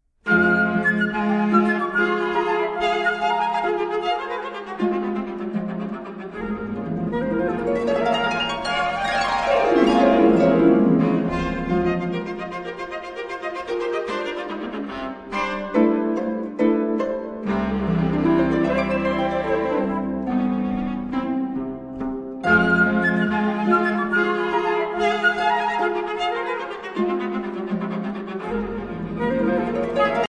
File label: Trio; Genzmer